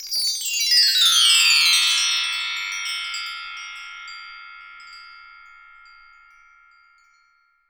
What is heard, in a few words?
BRASS M-L, TREE BELLS; Voice Crystal - Steve Reid Definitive Percussion